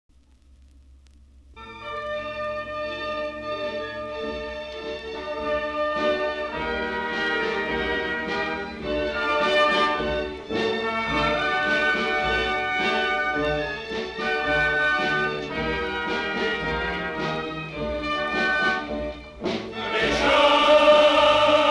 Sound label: Reprise Chorus; Mark Twain